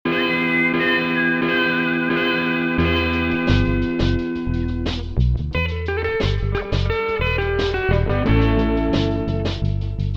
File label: Vocals